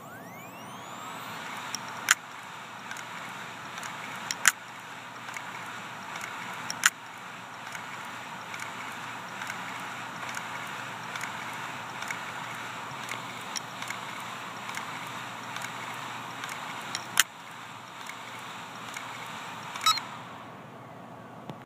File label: HGST 320 GB Clicking Heads